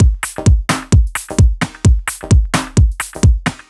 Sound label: Mono Phunk, Drum Loops, 130 BPM; Underground UK House & Electro